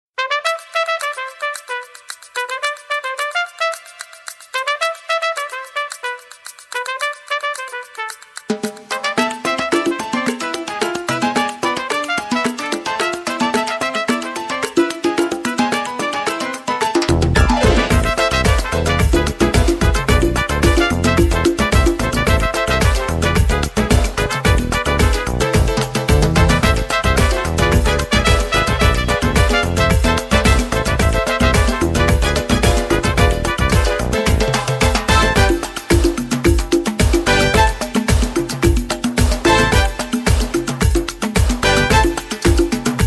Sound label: Instrumental